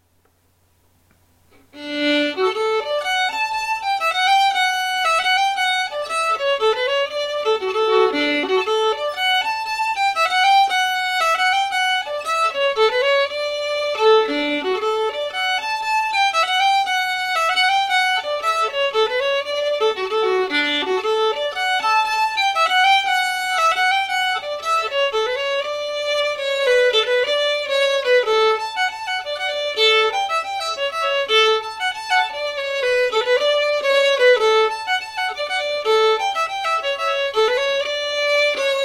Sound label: Polska